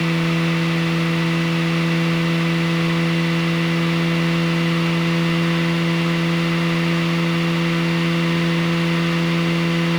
Mazda RX-8 231HP RENESIS, ONB, Designed, 5k RPM loop; Soundholder